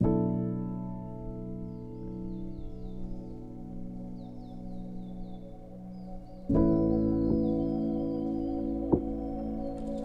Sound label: lofi hip hop